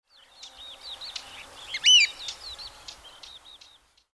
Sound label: oystercatcher